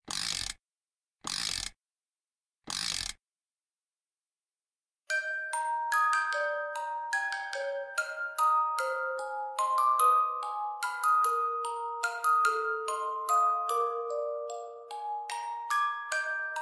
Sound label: Lullaby